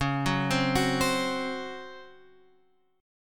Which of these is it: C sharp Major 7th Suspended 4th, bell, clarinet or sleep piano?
C sharp Major 7th Suspended 4th